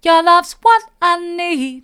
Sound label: mid, vocal